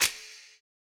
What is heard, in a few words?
Perc; rnb vibez